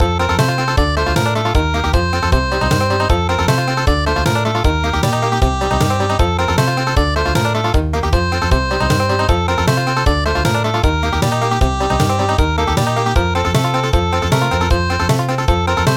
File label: Country; Lorig